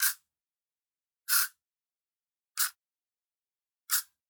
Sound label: Generic Slide; boom library; The Interface